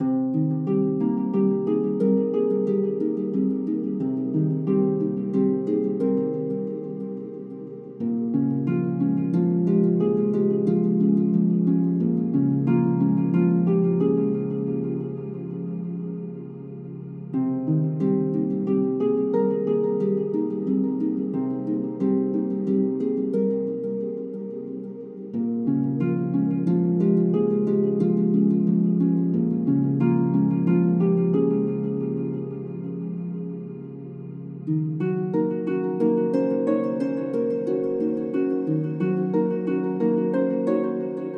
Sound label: Puzzle Harp Loop; Blood of Old